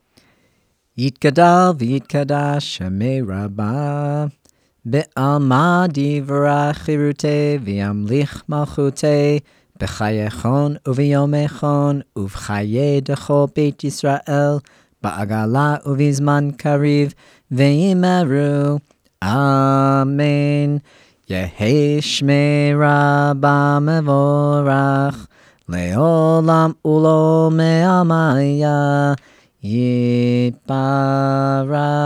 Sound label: Kaddish Shalem Slow